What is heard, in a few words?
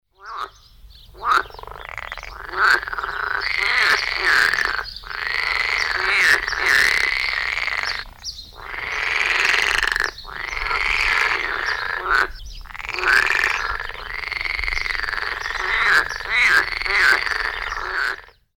Pelophylax esculentus; Wasserfrösche; Guenter Tembrock; tierstimmenarchiv; Wasserfrösche (Pelophylax lessonae und Pelophylax kl. esculentus)